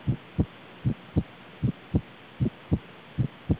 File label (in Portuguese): coarctação aorta; coarctação aorta page